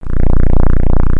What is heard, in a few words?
Purr; Halloween Night II